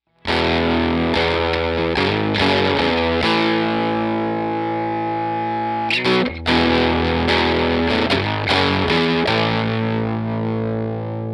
TRIWATT 12AT7 OD SG Bridge Tone Tubbys; trinity

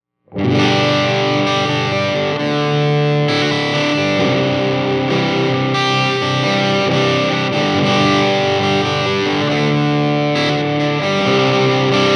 TRIWATT 12AT7 Les Paul Neck Fanes; trinity

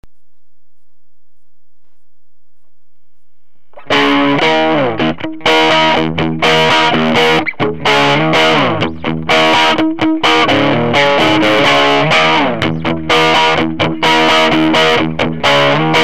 Tweed Strat; Dropped down the gain and volume to about 7 each

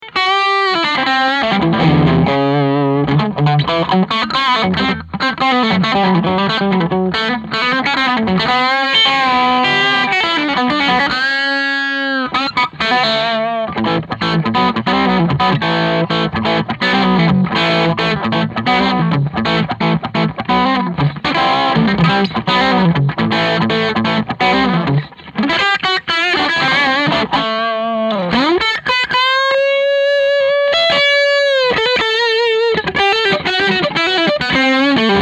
335 OD Fingers; Trinity OSD Amp; ODS

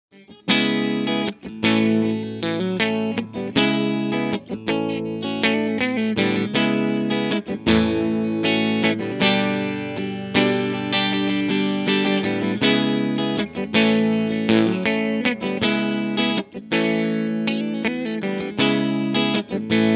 TC15 Ch2 Clean Lo hi INPUT; TC15 Ch2 Clean Munch Lo

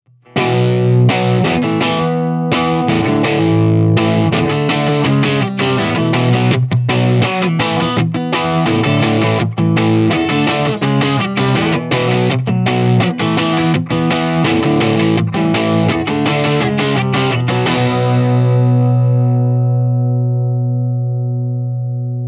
18W DIRTY 6V6 Hiwatt Fanes

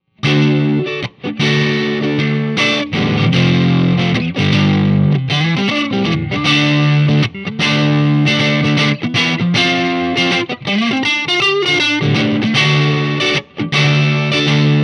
TRIWATT 12AT7 Fat Strat Neck Fanes; trinity

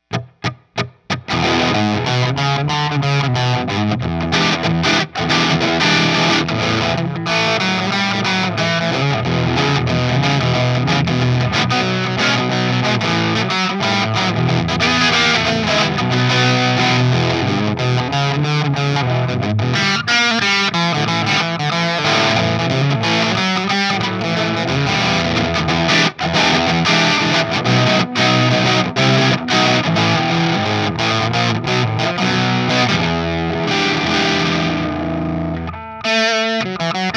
TRIWATT 12AT7 OD Epi Sheraton Bridge Greenbacks; trinity

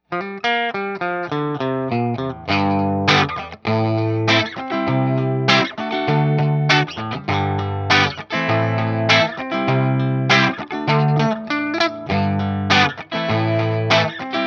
TRIWATT Dano Bridge Greenbacks; trinity; Phase Inverter Tube - 12AT7

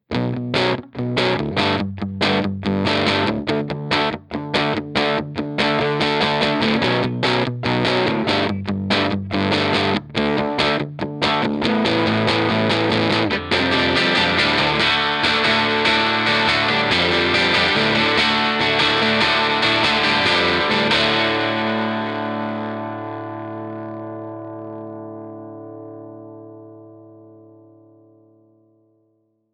DIRTY Alnico; JTM; Tone Tubby

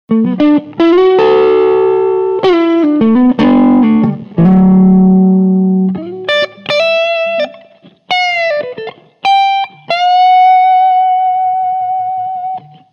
clean 335

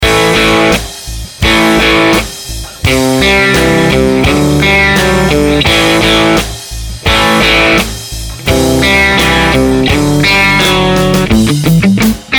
Strat Heavy; DRZ Carmen Ghia

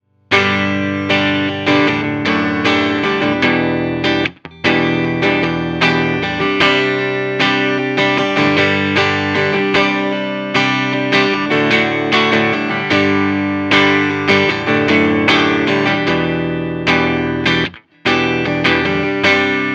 CLEAN Classic Lead 80; JTM

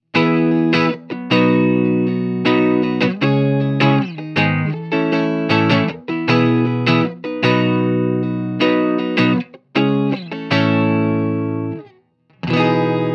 18W CLEAN Marshall G10

